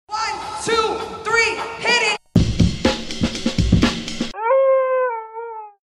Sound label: Coyote